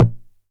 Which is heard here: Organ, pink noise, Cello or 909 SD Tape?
909 SD Tape